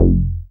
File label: Bass SH-101